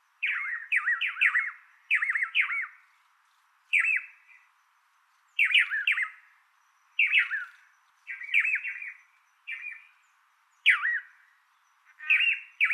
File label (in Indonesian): suara blue manakin